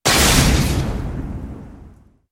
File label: Chain Lightning; Maelstrom